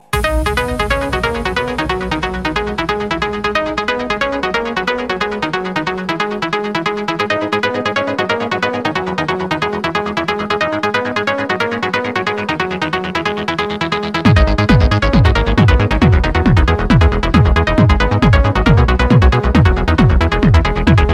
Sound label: Goa Trance Ring